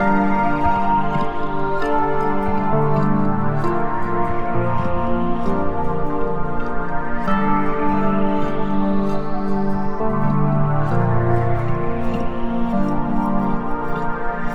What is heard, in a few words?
Pad; Dance